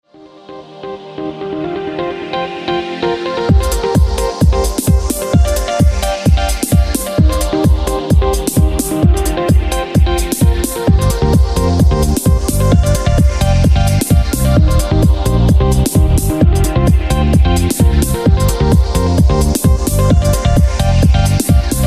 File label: dance music